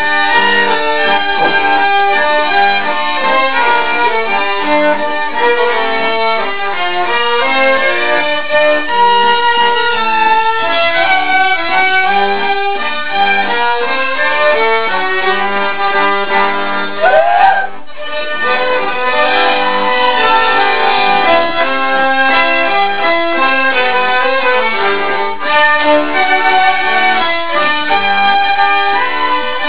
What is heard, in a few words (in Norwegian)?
Musikk; PhD dinner